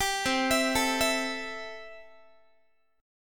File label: C 6th, Guitar; arpeggiated